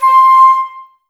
flute, Wood Winds, Flutes; Miroslav Vitous - Woodwind Brass Ensemble

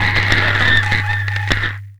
Paper Bumpers; Sonic Foundry (Sony Creative Software); Esoterik Beatz; Paper Fx

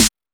Techno C; EMU XL-7

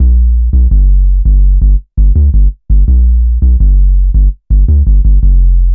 Hard 808, Bass Lines 166.5; Jungle; Zero-G Jungle Warfare 1, 2 & 3; 90 s Sample CDs

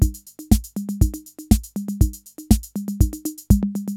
CR-8000 LOOP, DRUM LOOPS

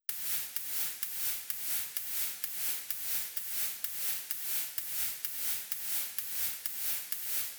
Drum Loop Ride Noisy, 128BPM; VEC5 Drumloops; VEC5 Multi Loops 128BPM; Loop Elements

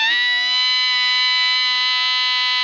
ALTO GR B 4, saxophones; Greytsounds - Philip Wolfe David Crozier's Saxophones; ALTO SWITCH

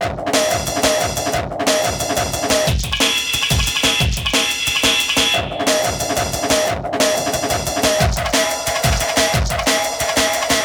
Victory Beat, Drum & Bass; Fluid Dynamics

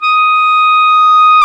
Clarinet

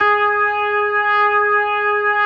G#4S, B3, Vintage Keyboards

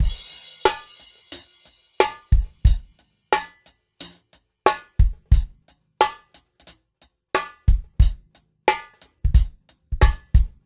Beat

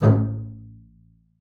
A1, bass spicc; Xsample VOL-5

